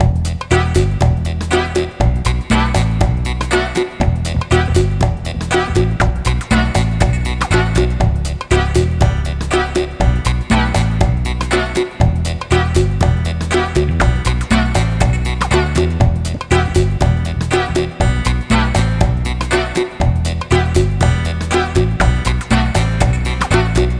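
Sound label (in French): musique; Mobiclic46; ROUTE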